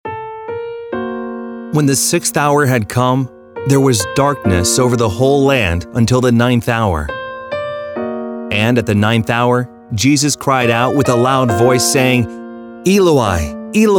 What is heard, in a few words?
Vocal